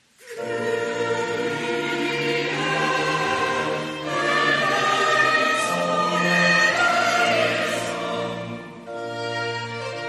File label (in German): kyrie, chorgemeinschaft, kirchenmusik; brigittenfest